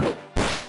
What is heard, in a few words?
Attack - Magma Staff; DQIV iOS SFX